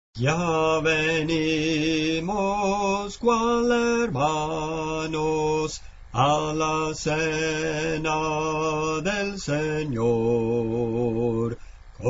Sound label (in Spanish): Bajo, Cantos Espirituales; 029 Ya Venimos, Cual Hermanos